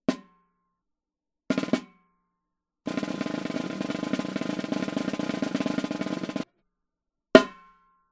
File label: snare drum with snare single hit multiple strokes roll rim shot; TAMA; Stewart Copeland signature snare drum